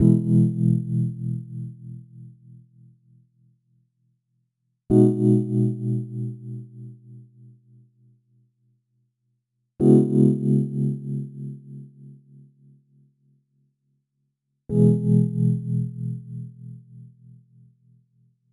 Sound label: 4 chords 98 bpm